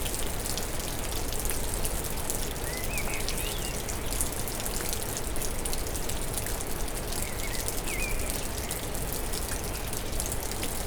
csendesedo eso futyulo madar

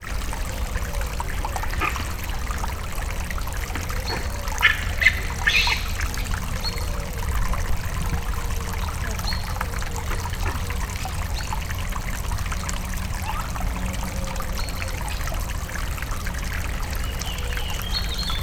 japán makákó; a felvetel elejen hallhato; szegedi zoo 2011

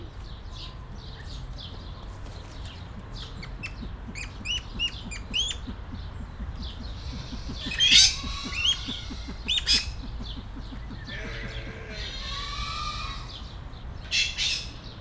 balra aranyfácán, középtájon ezüstfácán, jobbra vadászfácán; kecskemeti zoo 2016

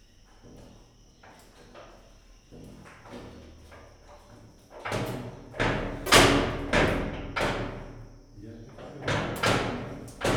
egymással szemben köp, csimpánz; gyori zoo 2014

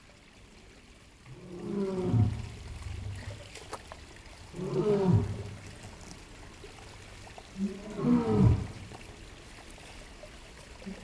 háttérben bömbölő oroszlán előtérben futkározó hiénák patak; jaszberenyi zoo 2016

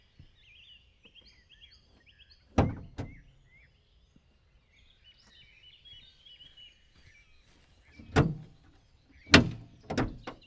motorháztető automatikus kinyitása, kézzel való lezárása, autó opel; vízház; cardioid

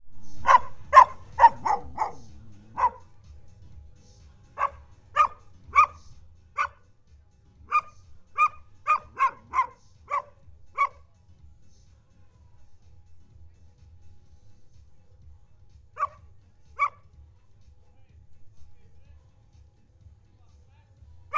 balra távolabb, kutya, Zakynthos; termeszetben

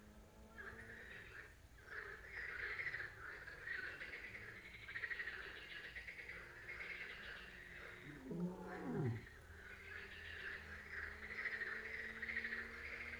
bömbölés éjszaka, oroszlán; jaszberenyi zoo 2015

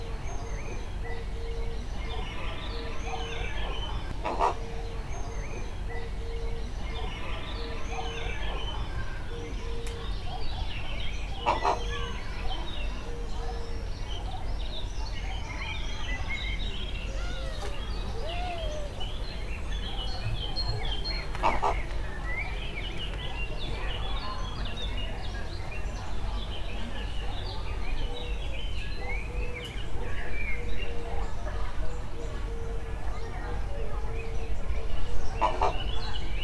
halkan, holló; akg; allathangok; veszpremizoo 2013

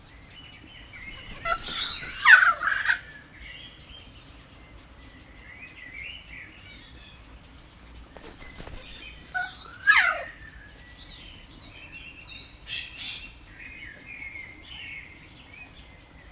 jobbról balra hangoskodva, majd vissza, kea; jaszberenyi zoo 2016

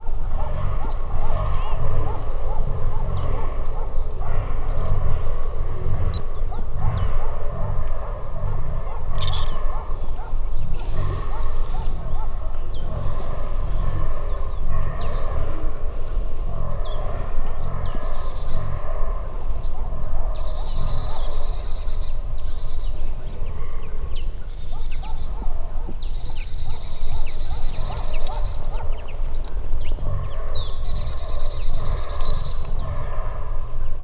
fényképezés madár, dámszarvas; termeszetben

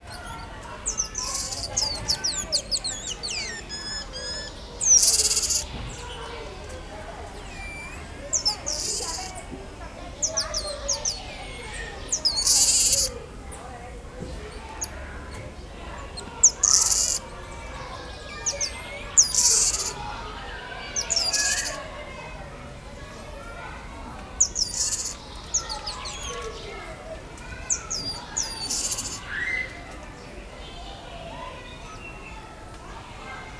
folyamatosan hangot ad, lisztmajmocska; szegedi zoo 2011

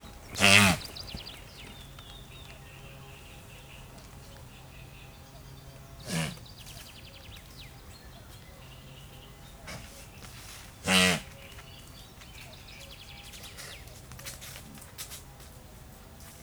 guanakó; szegedi zoo 2011

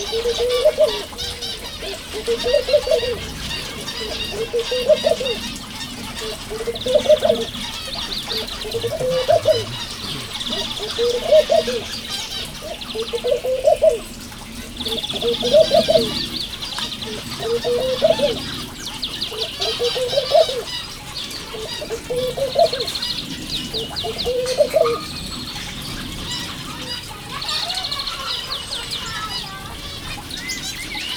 fokföldi vagy álarcos gerle; jaszberenyi zoo 2015

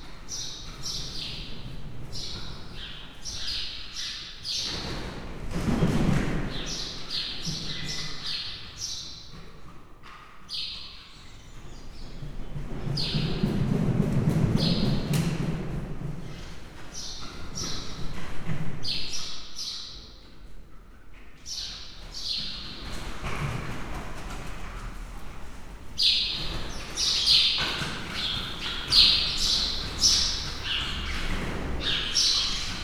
szárnycsapások verebek, rózsás gödény; Hortobágyi Nemzeti Park

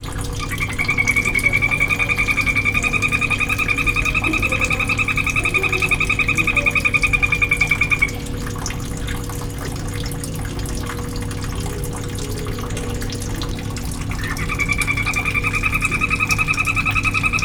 terráriumban, nyílméregbéka; pecsi zoo 2017

